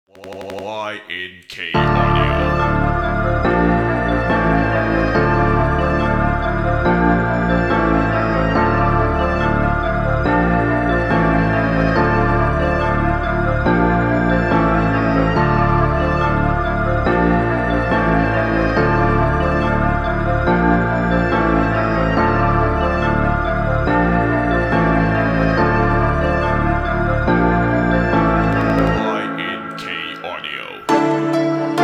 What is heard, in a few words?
Trap Keys; Keys 2 Success